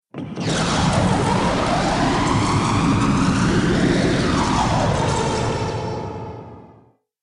Scifi Afterburner, spacecraft

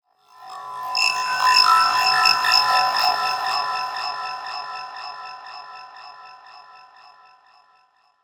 Enchanted Glade, magic; fantasy sound effect; Magic Sound Effects; role playing games, magic videogames

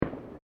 Fireworks Single Firecracker; Fireworks (Sound Effects:Backgrounds); Crafted Signals

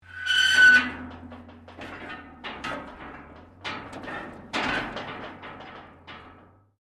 METAL RUSTY SCRAPE LONG; Metal (Sound Effects:Materials)

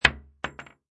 Bullet Shell Drop Machine Gun Hollow Wood; Machine gun empty shells fall on hollow wood; Impact Sound Effects

